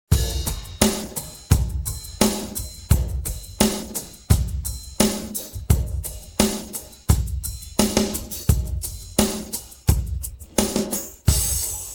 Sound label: pattern, drums